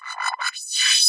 Data Pipe Transfer Short

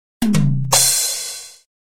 Badum Chhhhh

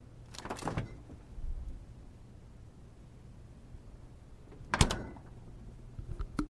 Fridge open

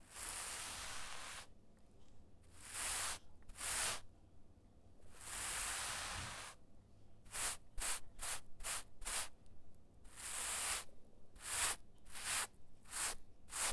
Scraping Of A Carpet With A Brush

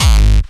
Bass Drum

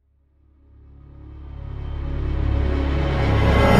Strange Crescendo

